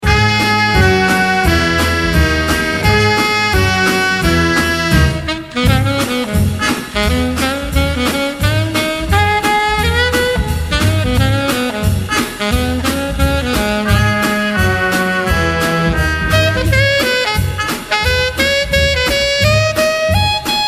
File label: DJ & Sax